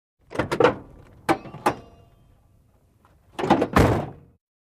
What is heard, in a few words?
Car Door, cadillac 1964; Rust